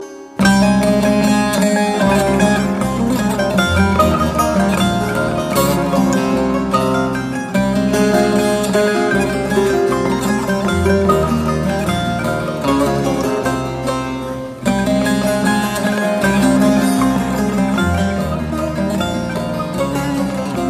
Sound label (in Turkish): bağlama zil sesi; android zil sesleri